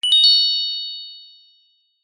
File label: fantasy magic chime ping wand fairy godmother